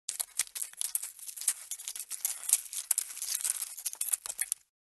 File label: Tarantulas